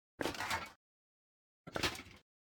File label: pick up and drop helmet; baldursgate